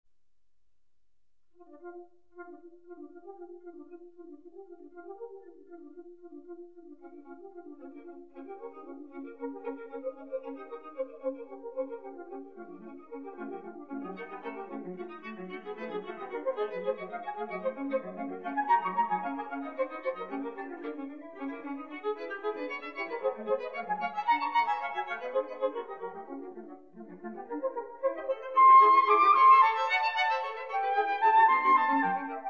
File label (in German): Serenade fuer Floete, Violine und Violoncello; 2. Satz